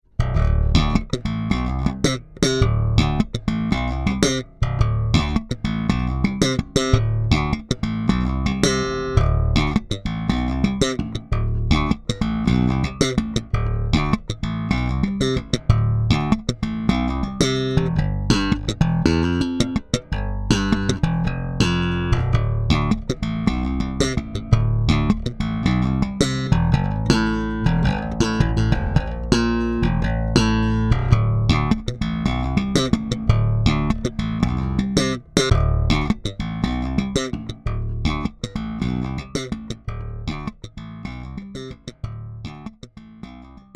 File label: BLUES BASS